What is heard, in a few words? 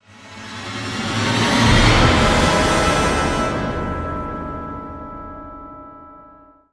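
stinger horn short; transformers war for cybertron gamerip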